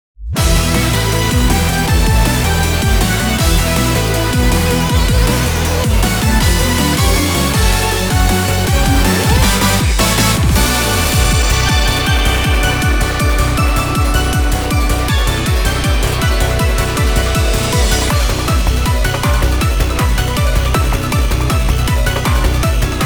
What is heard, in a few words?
ROUND CLEAR; NIGHT STRIKER NEO SOUNDTRACK -Revision